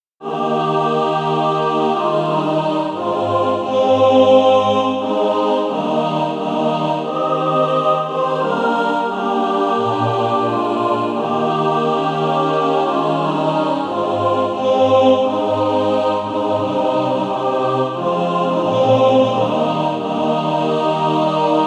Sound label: mixed; Deep River